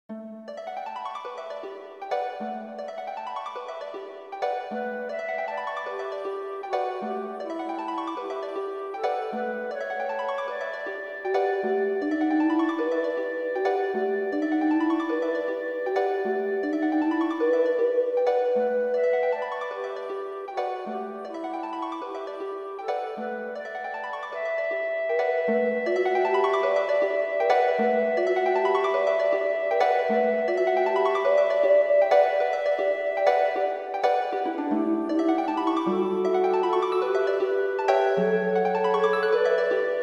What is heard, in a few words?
accompaniment track; spring carol; ssa; benjamin britten